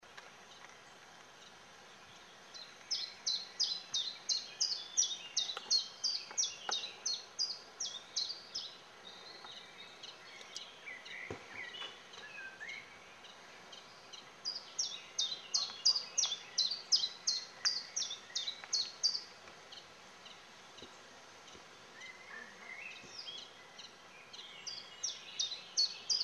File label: song germany, tristis